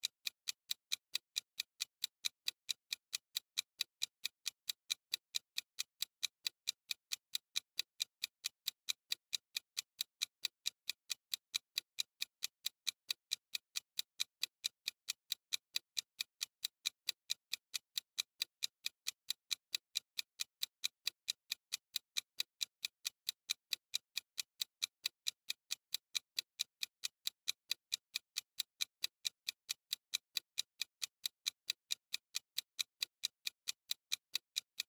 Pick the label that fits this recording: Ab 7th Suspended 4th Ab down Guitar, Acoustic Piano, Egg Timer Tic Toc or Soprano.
Egg Timer Tic Toc